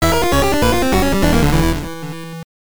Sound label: Fail Bonus Stage; zen intergalactic ninja; gb